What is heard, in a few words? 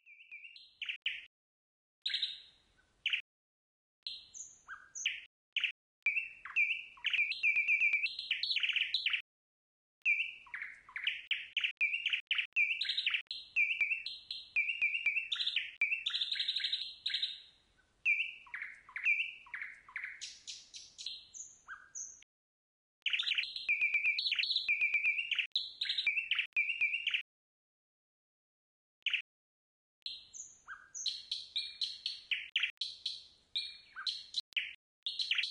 nightingale; max-msp; Techniques and Aesthetics of Plant-Generated Control Voltage